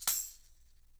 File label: TAMSHA, EFFECT CYMB, 3D Drums; 3D Sound